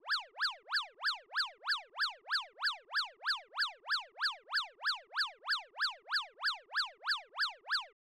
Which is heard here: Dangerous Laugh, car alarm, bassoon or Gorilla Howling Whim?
car alarm